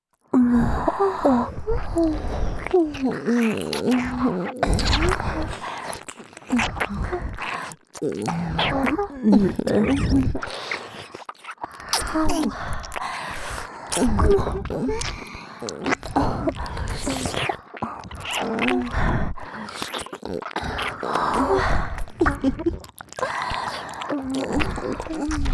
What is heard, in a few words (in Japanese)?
3人の舐め音; ドスケベママ達の口淫遊戯～催眠濃厚おしゃぶりねっとりフェラ抜き～【KU100】